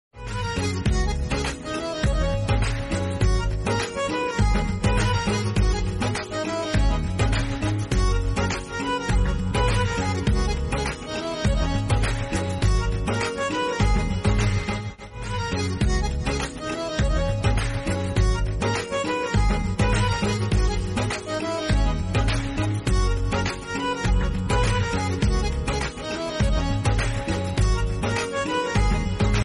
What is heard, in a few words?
Instrumental